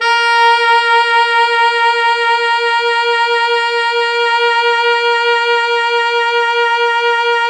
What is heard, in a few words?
Pop Brass A#3 R; Best Service ProSamples vol.19; AKAI